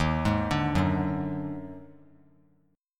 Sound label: Eb Minor Major 7th Double Flat 5th; JGuitar